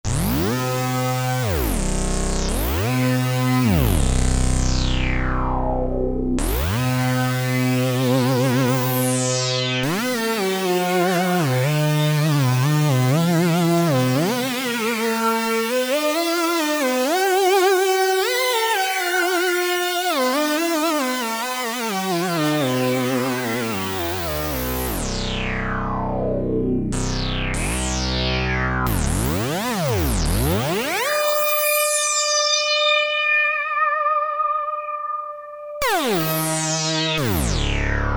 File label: Wicked Reso Filter, Prophet-5; Sequential Circuits Prophet-5 (Ver.3)